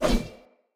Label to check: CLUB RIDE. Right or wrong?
Wrong